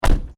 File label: Car: Door Close, Exterior; Cars; Vehicles; Car Sound Effects; Stuart Duffield